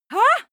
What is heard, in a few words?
female shout surprised